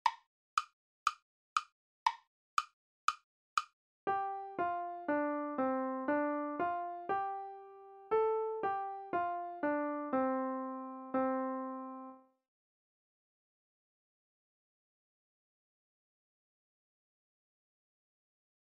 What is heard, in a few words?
melodic